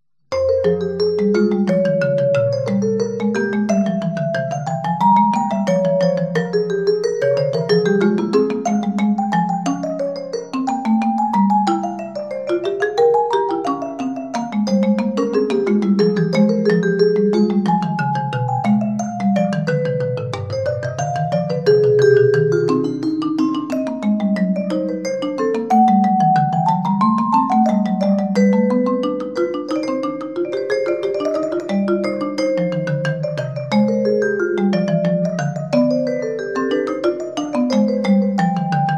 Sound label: BWV 780 Invention In F Moll, Mallets; Bach for Mallets